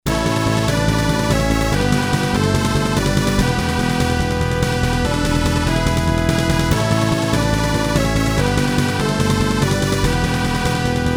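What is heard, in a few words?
Atreides Victory; dune2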